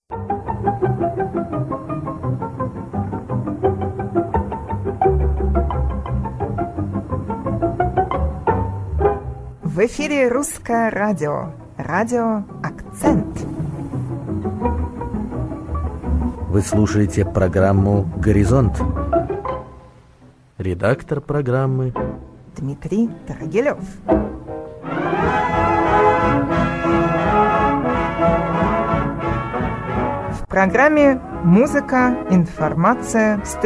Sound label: Trailer Opener